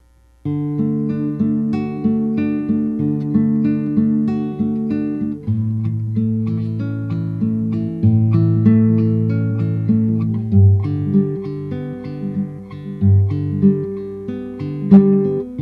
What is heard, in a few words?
Guitar Etude